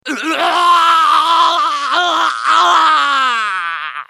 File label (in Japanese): 死亡; パンファルロ(戦闘型); 戦闘料理人パンファルロ